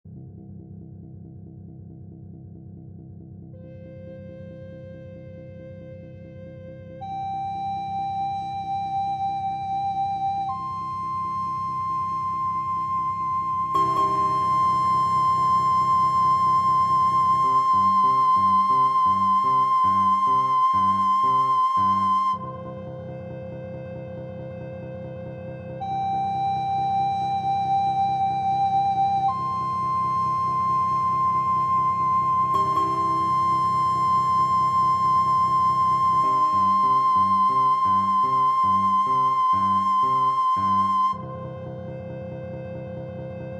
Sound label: recorder